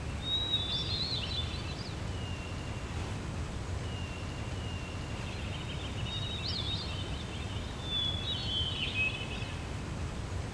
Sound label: Amb Wind Birds